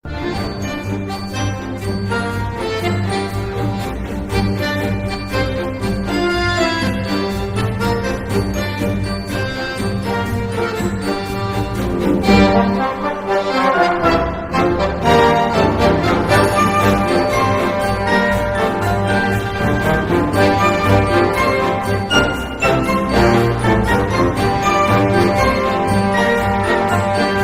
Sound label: questioning